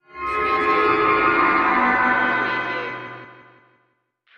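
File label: Production Element Feedback; Sound Effects Bible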